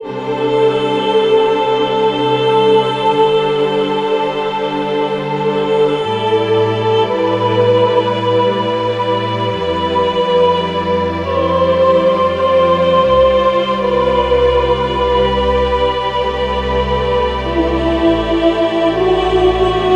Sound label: omit 3rd